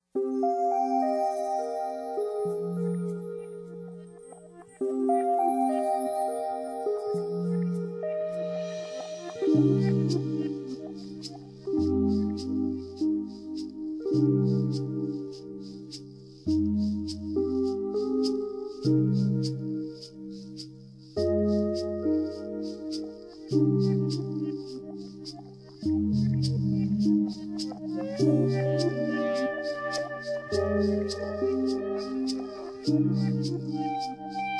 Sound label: Instrumental